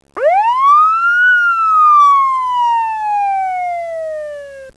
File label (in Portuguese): sirene, sons avisos